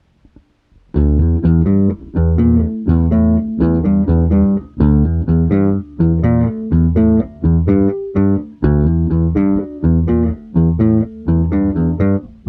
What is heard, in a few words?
attak bass